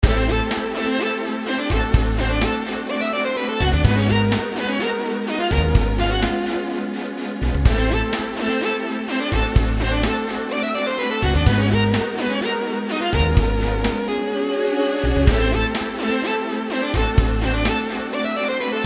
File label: Fanfare Loop; the outbound ghost